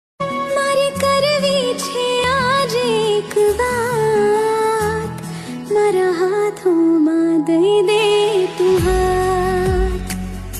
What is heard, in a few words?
gujarati ringtone